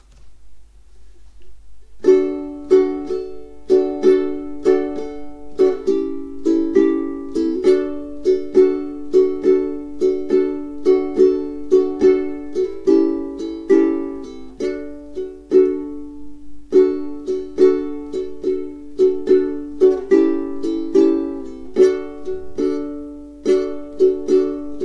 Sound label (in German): Ich geh mit meiner Laterne Akkorde - Auf und Abschlaege